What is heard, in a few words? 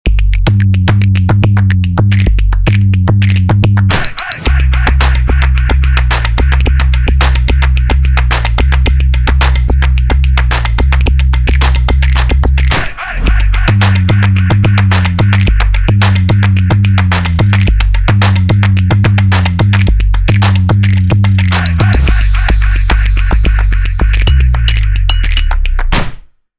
Fast 808 Bass Snap And Clap; gamerip; shellshock live; android ios linux macos ps4 windows xbox one